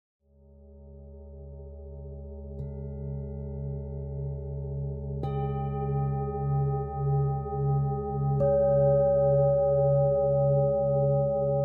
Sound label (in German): klangschalen halschakra merkurton; klangschalen chakra meditation